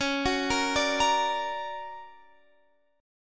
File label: D Augmented Major 7th, chord